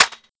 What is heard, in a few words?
lmg mag in; tf2classic